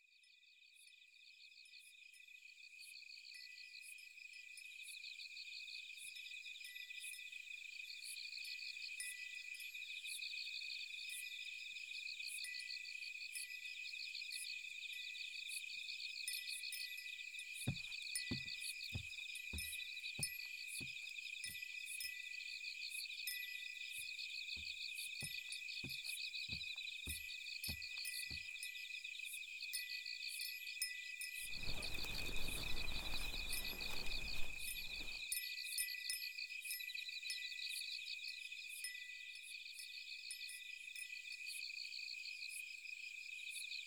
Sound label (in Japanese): 耳かき・左, ASMR; おねぇさんすばる